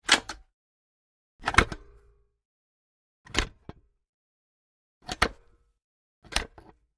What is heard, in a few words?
Telephone Receiver, clicks